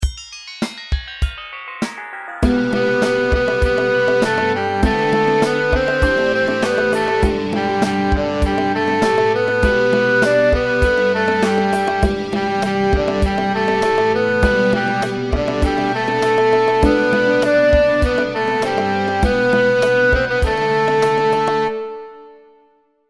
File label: jingle